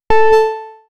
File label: modern notification blip; cyberpunk